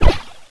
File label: gun